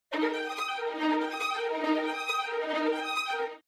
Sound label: arp